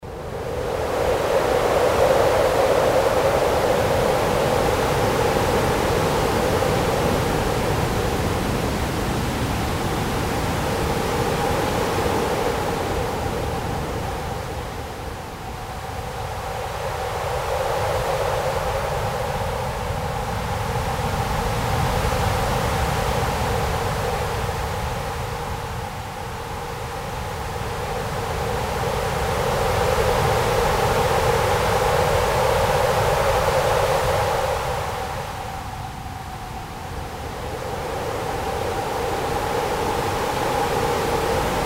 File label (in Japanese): 強風の音